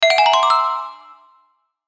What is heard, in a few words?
Pixie Dust Glockenspiel Ascend; Pixie Dust Glockenspiel Ascend Short; Mallet; Production Element Imaging Element Accent Transition